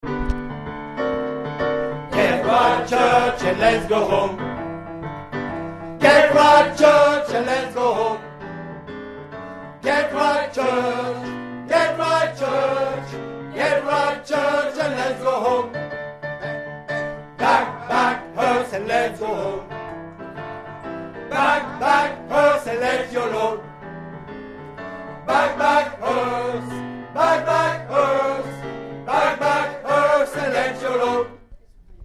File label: HOMMES